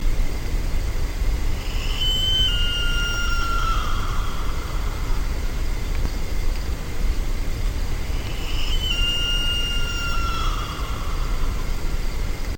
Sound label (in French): chant oiseau